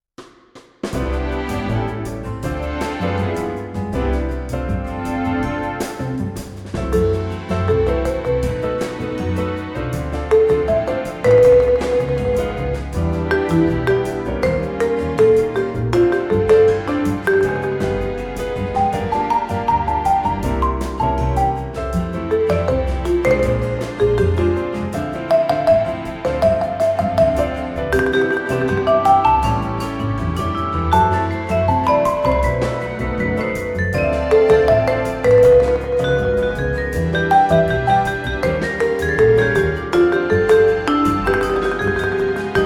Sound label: Mallet Bossa